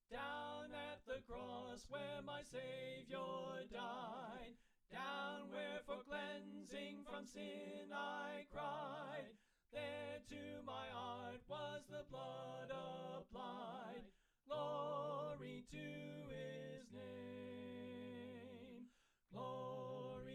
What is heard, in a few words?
Alto Left